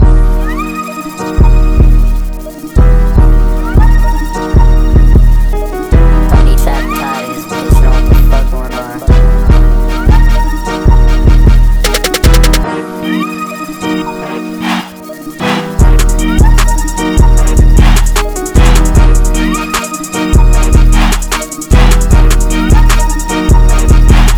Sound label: 152BPM E♭m